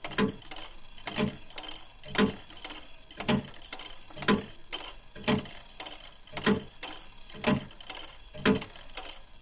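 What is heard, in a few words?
Broken Clock Loop; silent hill homecoming 2008 gamerip pc ps3 x360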